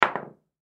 Pool Drop Cue Ball, Pool Drop Cue Ball On Pool Table; SPORTS; Pool (Sound Effects:Sports); Billiards